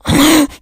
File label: become enraged, infected